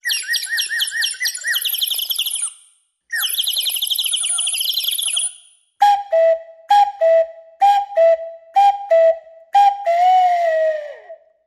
Tweets and Cuckoo

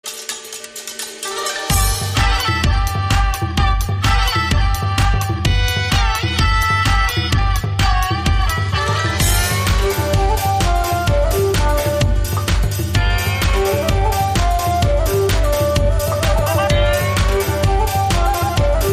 Techno Asian Fusion

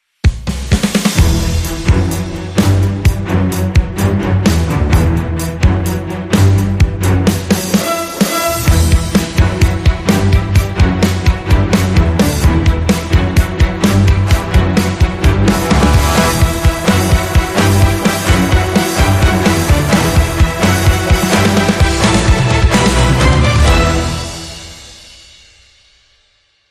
Hybrid Sting Fifteen Full

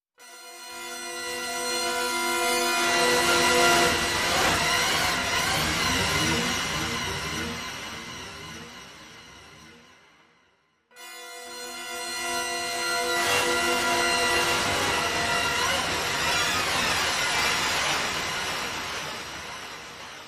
Abstract Noisy Guitar

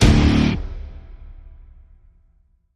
Stab Guitar Single (D)

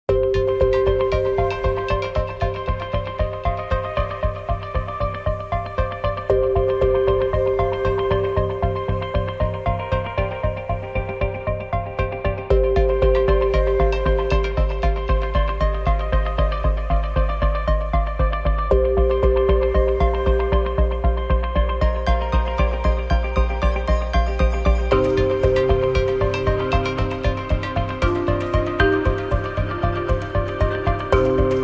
No Kick Drum

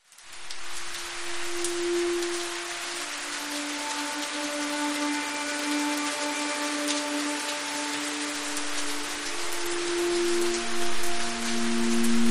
Rainy Strings

